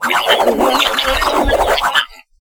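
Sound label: Thorn attack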